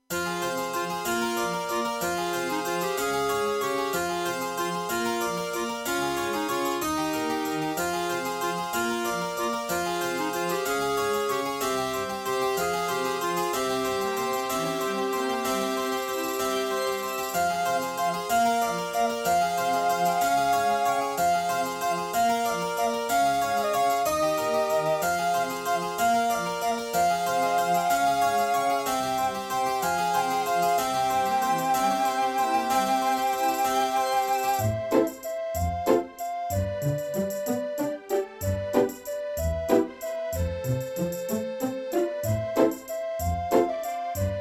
Serdaco X3MB Buran; Death Gate

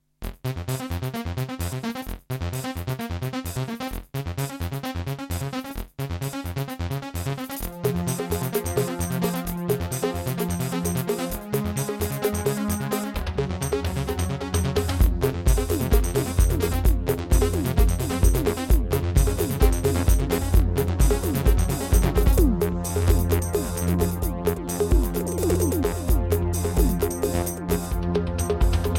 Terratec WaveSystem SCW001-2; Descent - Level 1

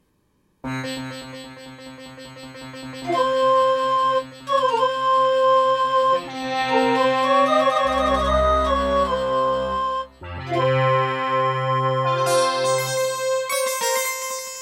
Intro, Procomp Pro-Multimedia; Prince of Persia 2 - Intro

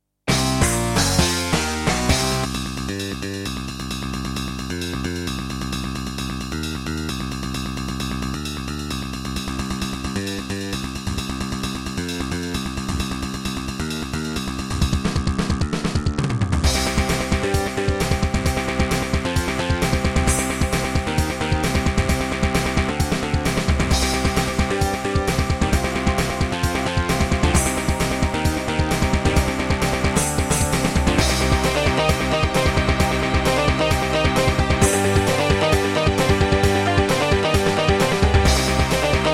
Pine PT-202 Vivaldi Wavetable DB; sigil doom episode 5

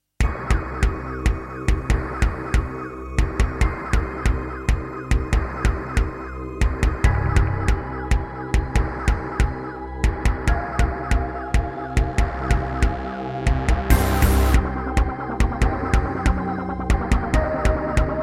NEC XR385 (DB60XG Clone) GSMODE; sigil doom episode 5

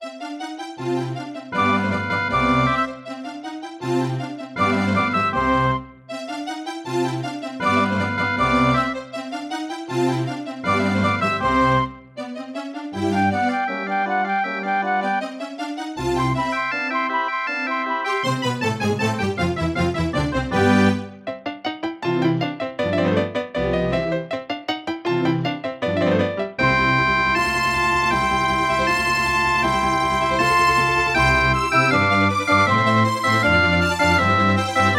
Mozart - Concerto K467 partial, Casio CTK-800; classical

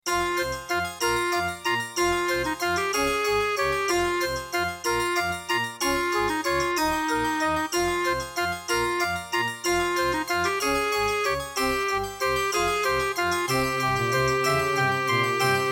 Creative Wave Blaster II; Death Gate - Track 6

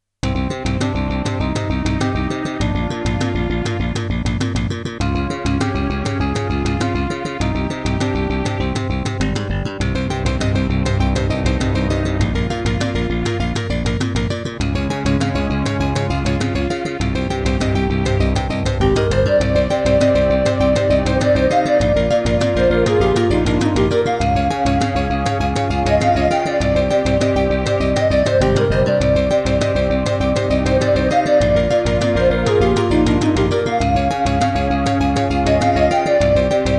Boss Dr. Synth DS-330; Hocus Pocus

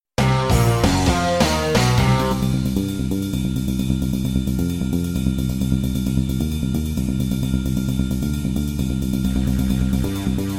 Roland Edirol SD-20 GM Contemporary; sigil doom episode 5

